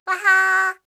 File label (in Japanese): wahaa, awateru